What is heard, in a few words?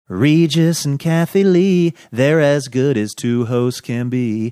singing